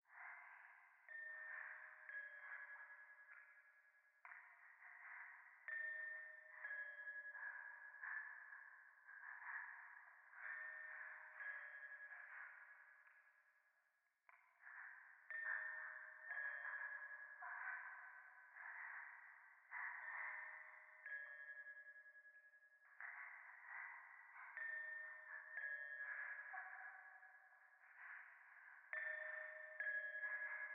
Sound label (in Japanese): 廃墟 右耳快感吐息 神社